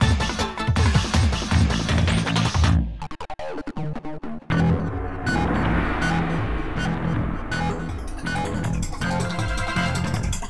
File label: Action END